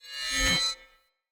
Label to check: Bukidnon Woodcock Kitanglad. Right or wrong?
Wrong